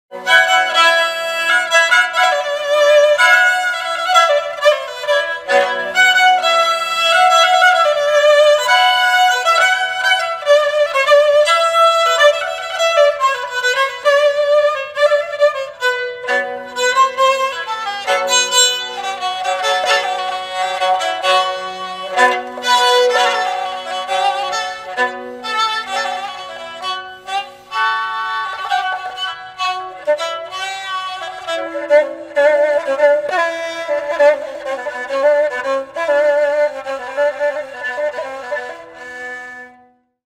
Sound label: fiddle; کمانچه